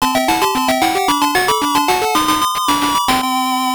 Round Clear; Fantasy Zone II The Tears of Opa-Opa; System E; arcade

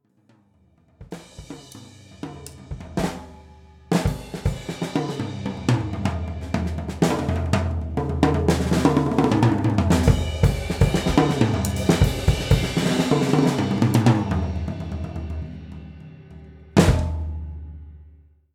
Fireworks sting, soundtrack; minecraft story mode gamerip 2016; Order of the Stone

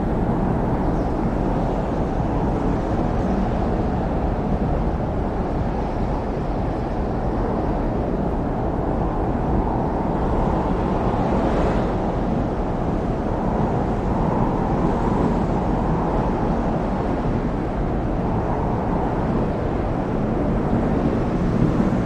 Wind northwest

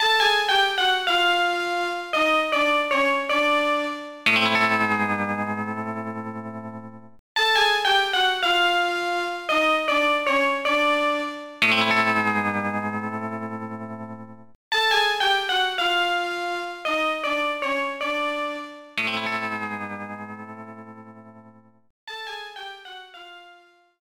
Music Gen Huge Failure Loop

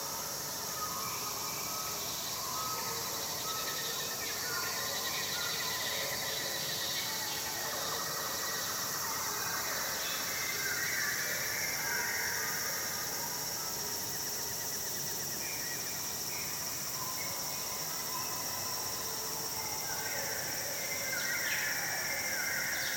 ambience - alien jungle; LEGO Batman 3: Beyond Gotham

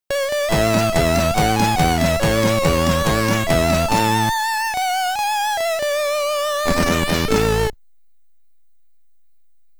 SID Stereo, commodore 64; Spain

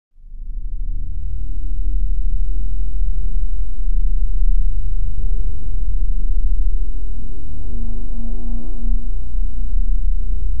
Linear Tense; the walking dead season one

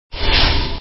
attack

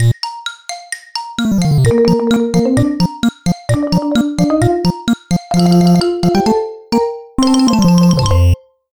Flip Panel; Donkey Kong's Crash Course; Flip Panel Demo

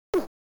squirt

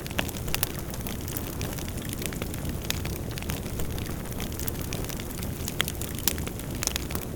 FIREPLACE

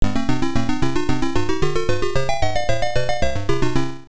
Level Clear; Akazukin; arcade

